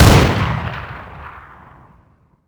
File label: sawnoff shotgun attack; Rust